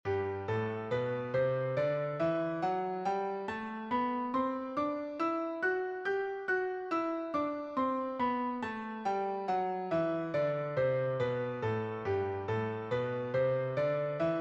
Scale G Two Octaves

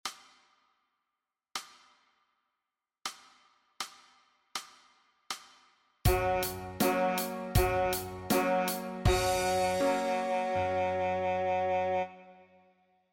sax E flat

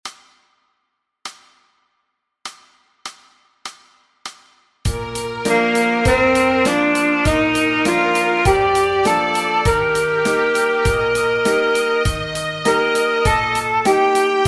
Sax G Scale Quarter

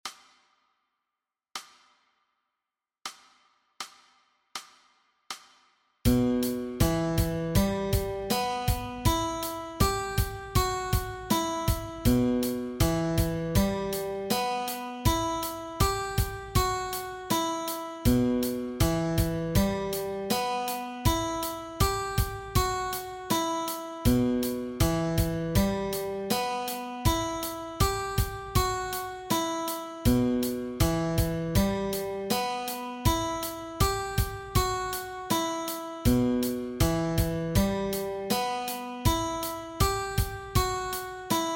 Guitar Exercise

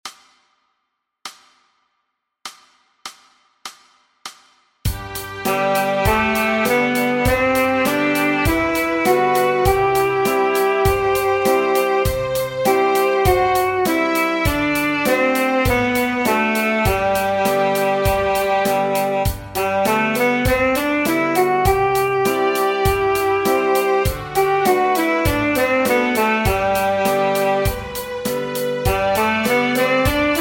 Sax E Scale Quarter

